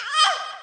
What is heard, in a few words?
die novice female